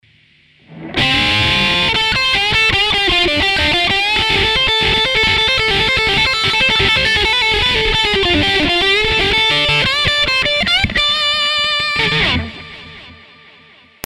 American hi-gain lead

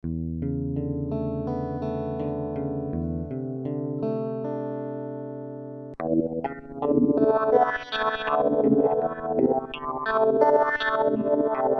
Underwater

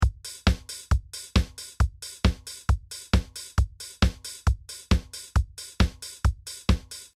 Trance

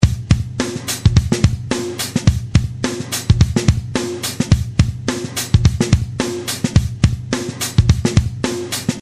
Two Tone

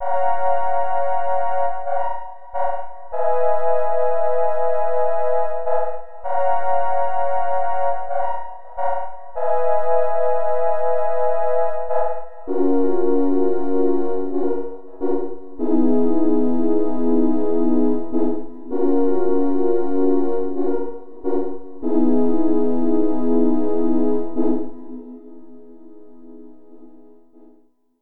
FM Detune Pad

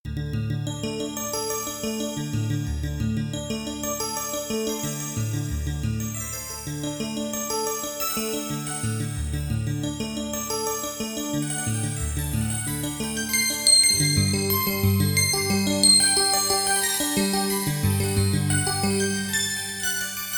Xmas Bells

